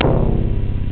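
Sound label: bass guitar; jazz jackrabbit 1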